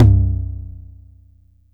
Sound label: Bass Tom, Jomox Airbase99; Drum Machines